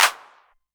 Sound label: Clap, Novation Drumstation; Novation DrumstationV1